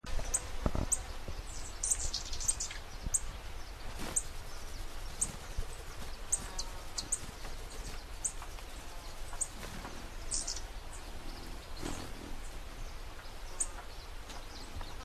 sword billed hummingbird

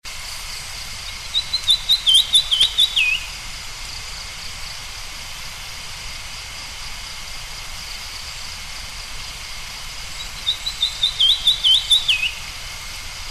lesser cuckooshrike

